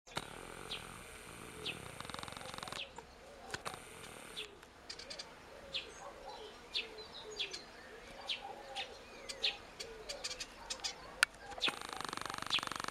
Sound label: Sylvia atricapilla; SETE